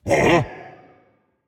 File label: notice